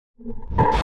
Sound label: HOUSE FX 125